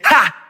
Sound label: ha, Voice ooh ahh